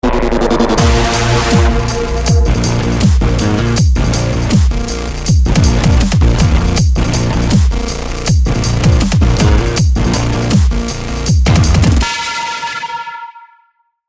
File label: start action, music